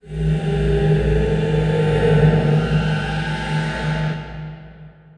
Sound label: cave hit; atmosphere